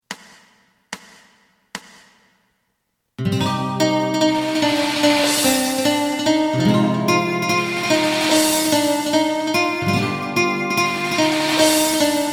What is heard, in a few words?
bass